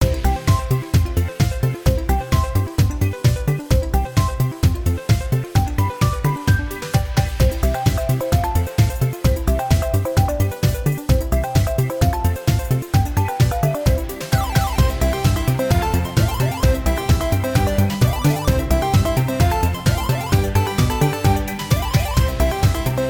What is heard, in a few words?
freespin melody; Golden Joker